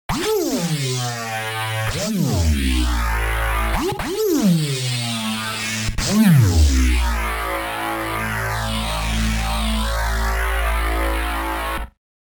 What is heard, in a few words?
Rapid Sync Envelope; Parawave